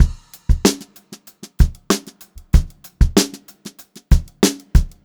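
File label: STRAIGHT VARIATION, Funk-Groove Drumloops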